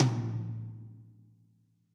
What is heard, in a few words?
tom, instrument; minecraft; wynnpack; Festival of the Spirits